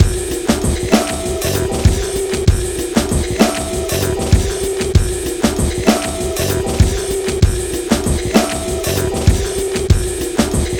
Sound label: 97 bpm, Breaks; RUNNING; 90 s Sample CDs; Planet of the Breaks